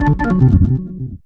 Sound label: ORG RIF; E-Lab; X Static Goldmine 2; akai